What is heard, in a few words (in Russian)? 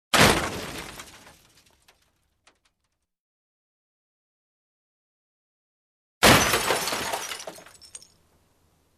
avariya bolshoj udar steklo metall